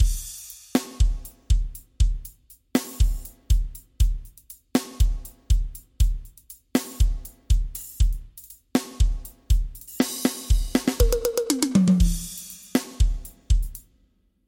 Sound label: SD Arrocha